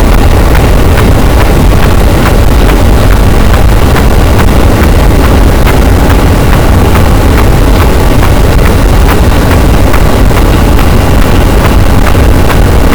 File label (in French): cachalot, boat; Physeter Macrocephalus